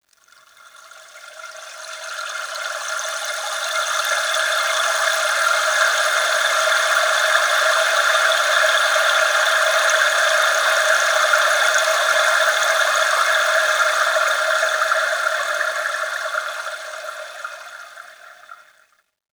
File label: Water Stream Trickle; Chalice Well